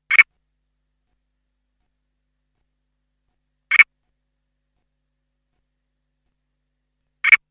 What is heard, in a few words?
Pseudophryne dendyi (Southern Toadlet)